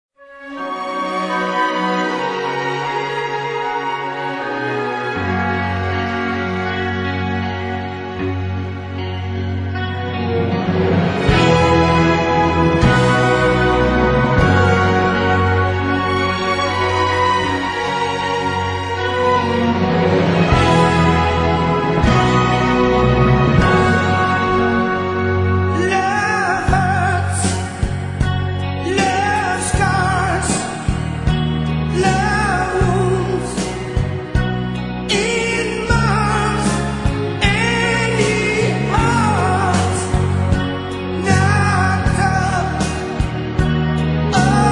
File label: (Philharmonic Orchestra)